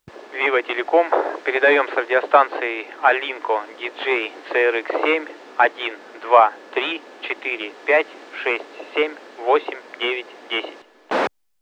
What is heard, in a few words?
alinco dj crx7 tx